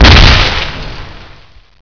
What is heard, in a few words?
explode